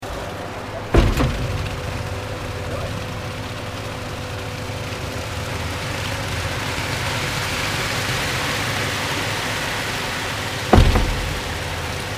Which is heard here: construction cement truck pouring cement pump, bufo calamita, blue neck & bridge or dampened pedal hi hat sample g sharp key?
construction cement truck pouring cement pump